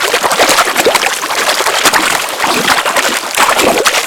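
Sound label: Infested Moa Slow Bomb Projectile Loop; Warframe; Attacks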